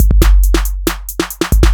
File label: Groove 138bpm; GroovePizza